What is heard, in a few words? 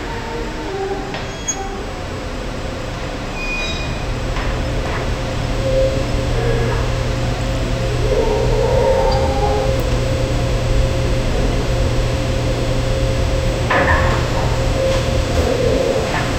creepy ambience in an aba, hospital